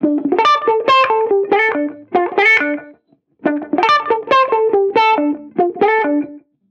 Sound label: Reggae Guitar Loop 140 BPM D Maj; Vibrations; Cymatics; Vibrations Guitar Sample Pack